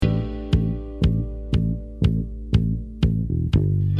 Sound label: bass